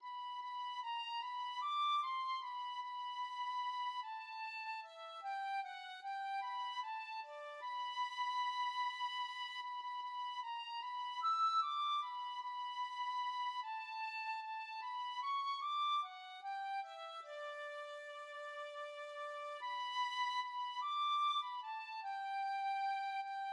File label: turn your eyes, tin whistle